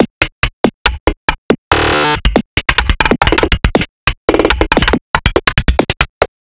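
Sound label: rhythm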